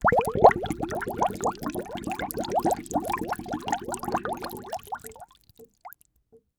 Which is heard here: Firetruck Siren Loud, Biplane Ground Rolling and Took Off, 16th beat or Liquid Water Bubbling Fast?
Liquid Water Bubbling Fast